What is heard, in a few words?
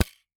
Weapon Bow Cross Bow; AdobeCC